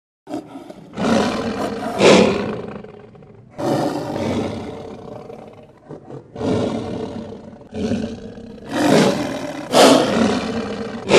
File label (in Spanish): León rugiendo